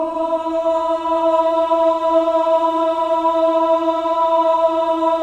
MEN AH, Choir of Ahs; Roland - LA Composer vol. 1

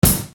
Bass Dr, EuroDance Bdrums

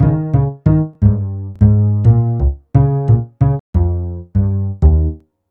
Happy Upright, Upright Bass

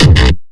bass kick

